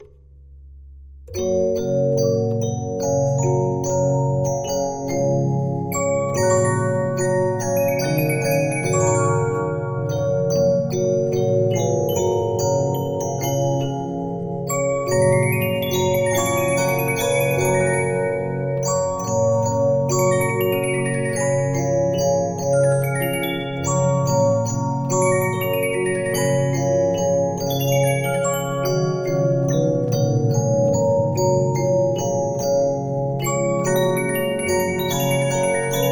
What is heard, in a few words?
Regina, 15inch disc musicbox